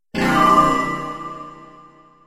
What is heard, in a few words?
Industrial metal